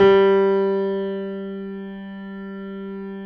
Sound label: JAZZ G2, Keyboards Acoustic; AKAI; USB Soundscan vol.17